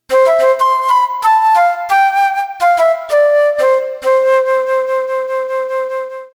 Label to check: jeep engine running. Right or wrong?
Wrong